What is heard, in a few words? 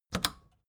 record player power click off fast